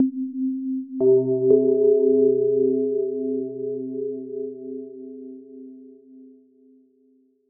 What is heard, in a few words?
Undertones